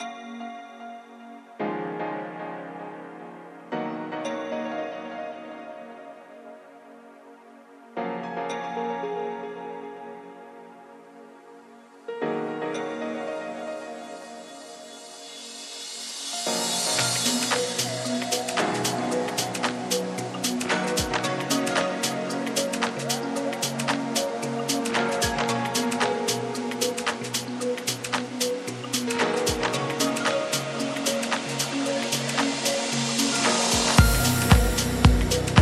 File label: electro dance Sweet piano